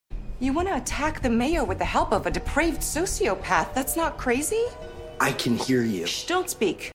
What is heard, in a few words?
Shush